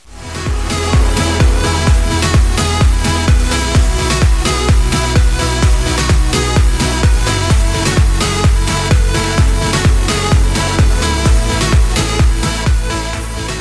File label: christmas victory; ze christmas battle